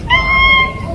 meow

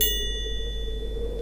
bell, christmas

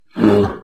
bear death

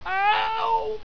voltigore die

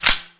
mag bash

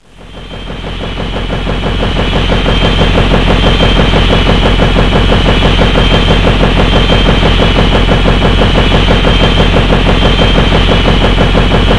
rotor

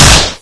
fire both, weapons

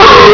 med shot no

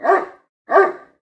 pseudodog idle

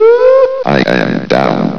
die

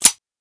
bolt close, weapons c96g

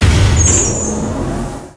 door stop

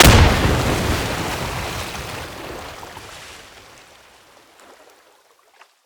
det, wpn gren

